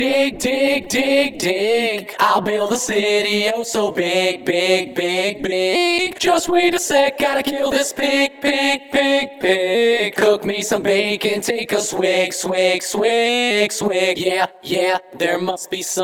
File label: TNT Vocals